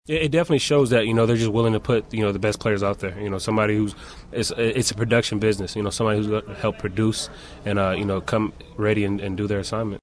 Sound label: BANJO